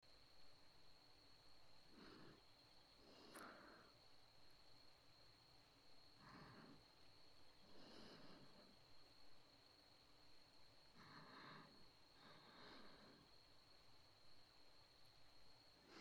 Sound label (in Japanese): 右耳 吐息 環境音あり ループ音源; ハクの吐息&寝息